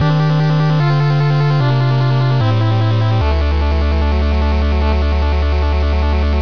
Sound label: complete; sven; skill course